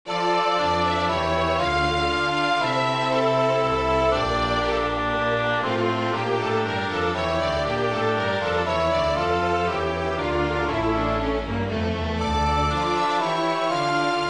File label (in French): menuet midi